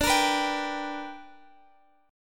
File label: B-Minor Major 7th Sharp 5th D